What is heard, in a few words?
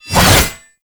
Bayonet Melee Lunge; Warframe; Tenno